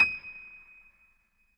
MONO, Acoustic Piano

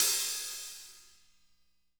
hat slosh

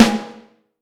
Snare Drum; Q Up Arts; Johnny.C's Vintage Snare Drum; 5X14

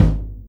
BD, Drums Acoustic; USB Soundscan vol.10; AKAI; 90s sample CD; ALL BD

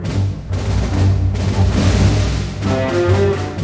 BOLE, ORC Bolero; East West - Scoring Tools

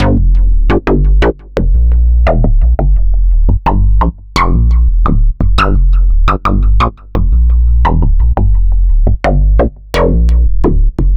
Synth Bass

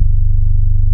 MICRO G1, ANALOG BASS; Music Store - Claudius Diemeter Schwerlos

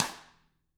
DW STK, Drums; ILIO; Double Platinum Drums 1

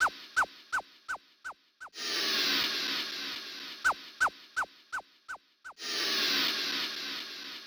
SynFx Loop 125, Loungin House; Equipped Music; Music-Fx Loops

